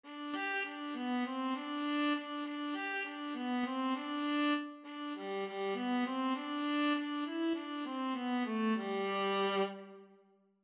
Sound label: pinpon, viola